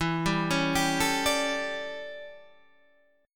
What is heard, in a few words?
D Major 7th Suspended 2nd Suspended 4th